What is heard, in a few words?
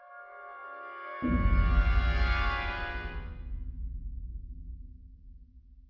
Battle Transition; Mine